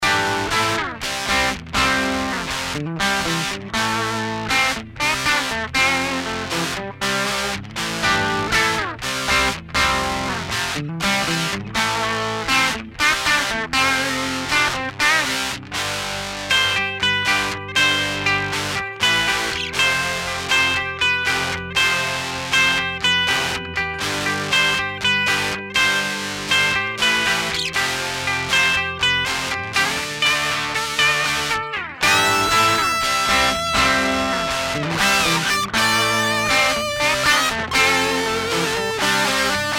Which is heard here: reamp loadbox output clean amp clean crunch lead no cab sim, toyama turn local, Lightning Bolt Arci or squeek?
reamp loadbox output clean amp clean crunch lead no cab sim